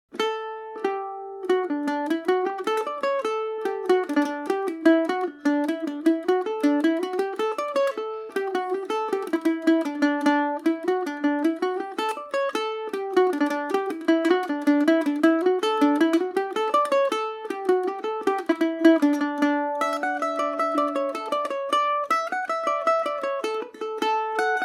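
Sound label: D major, Irish mandolin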